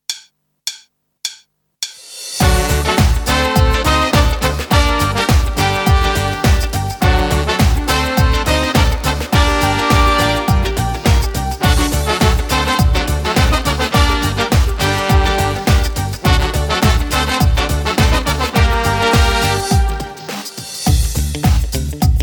cumbia

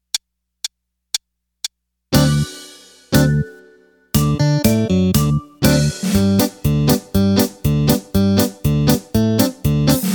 Polka

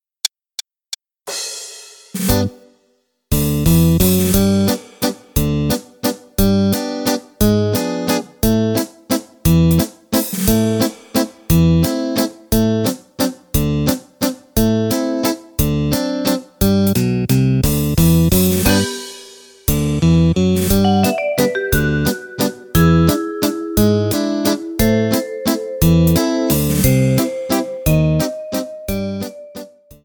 Valzer Musette BASE

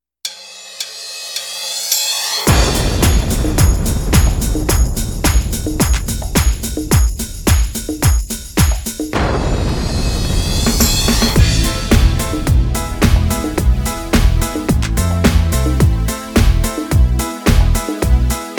Cumbia BASE

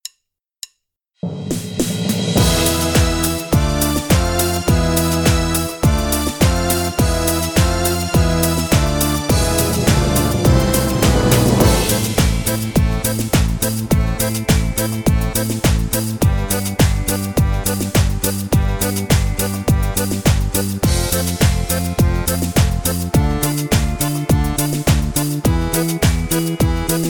base tagliata, cumbia

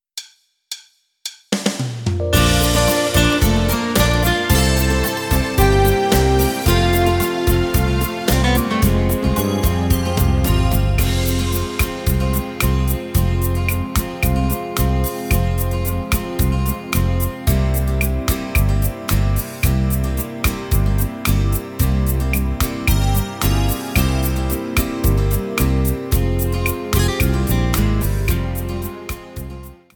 rumba beguine

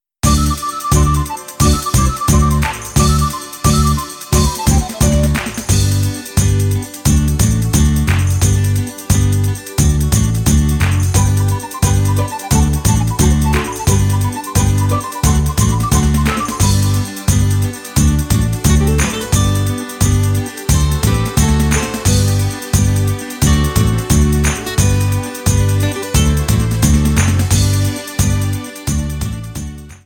Pizzica, BASE

